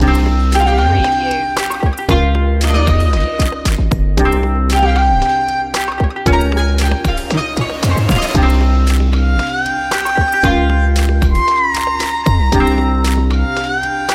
desert market loop

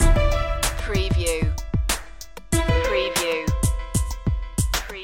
tension loop

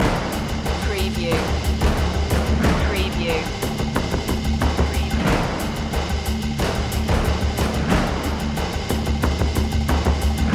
agent run loop

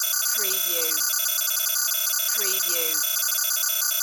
Data Mechanical Beep

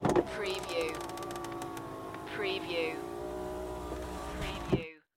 Auto Window Up; sound effects bible

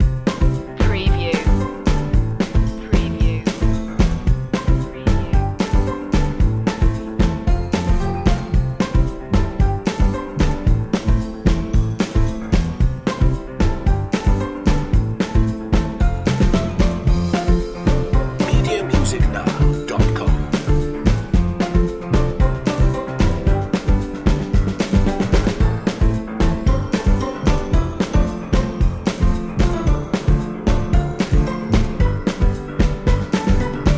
fruit soda loop

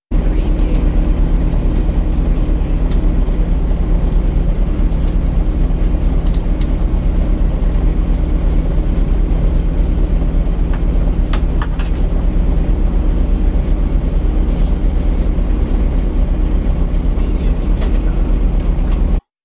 Rally car tickover; beanstalk audio

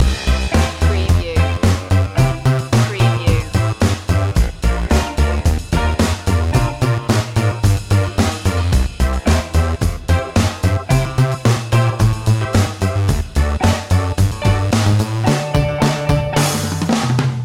silly and funny loop

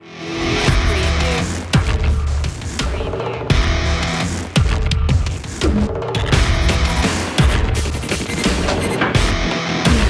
hip hop

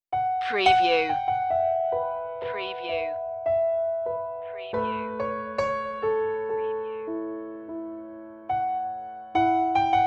slightly hopeful piano only

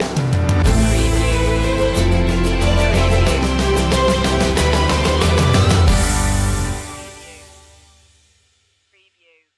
open door sting

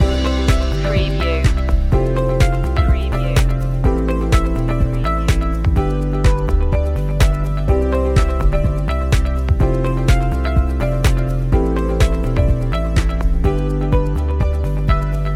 corporate thoughts loop

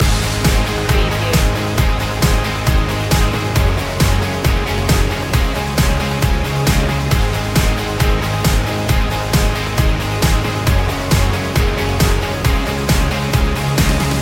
rock drop and roll loop

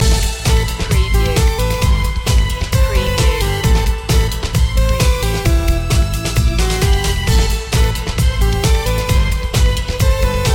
retro video game

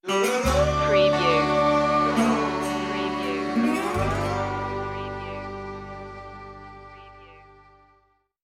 cajun stinger

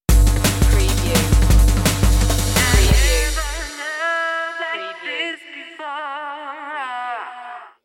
deep pulse stinger

